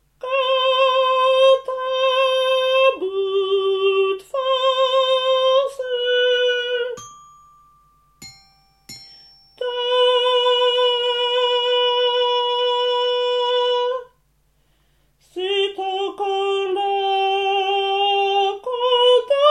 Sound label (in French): tango coda tenors